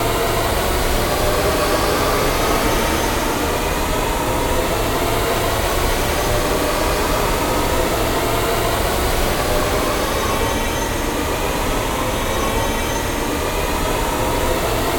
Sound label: Loop Riser; Crayola Scoot; Splattag